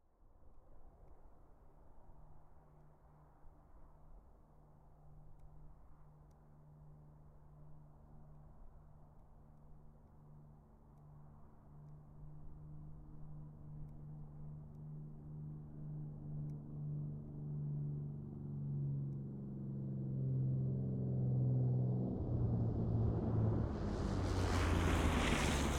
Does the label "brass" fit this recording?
No